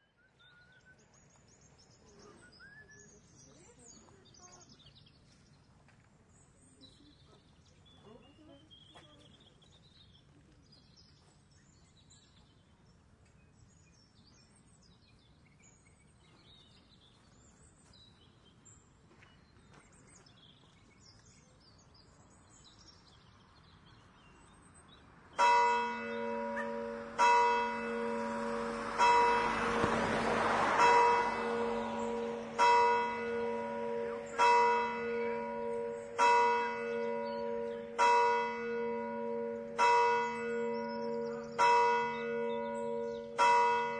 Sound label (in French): cloches aureilhan